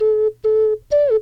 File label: Ocarina Loop